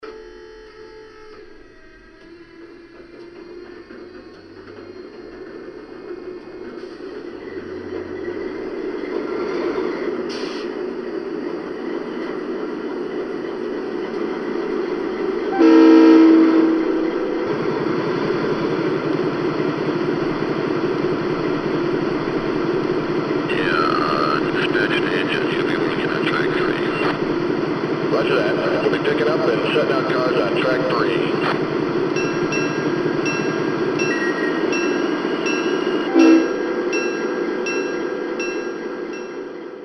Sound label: GE DASH8